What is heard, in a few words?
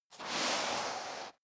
step boots sand slide long